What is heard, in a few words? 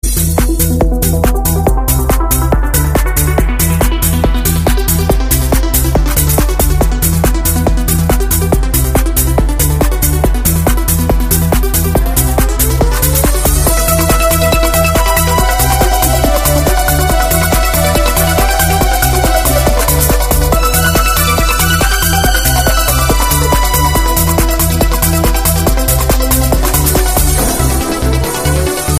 fast music call notification tone